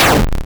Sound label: Shot (Sound); Gloom